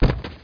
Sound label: body hit; specops